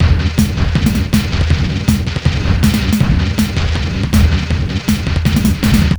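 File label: Master Loop, beats; Sonic Foundry (Sony Creative Software); Esoterik Beatz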